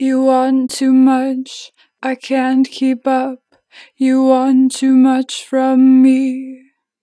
You want too much, 4 bar vib, Vocals, Natural Vibrato; Harmor Vocals